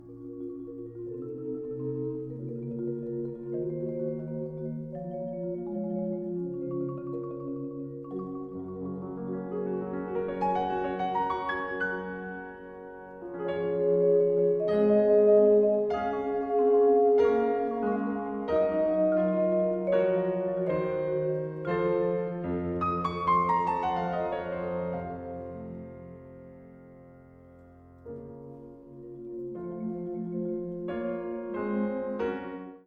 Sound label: Choral